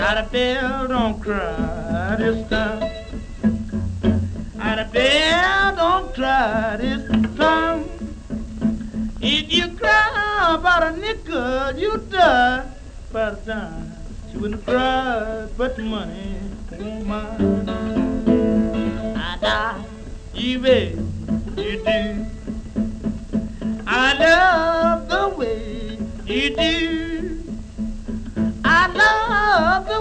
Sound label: blues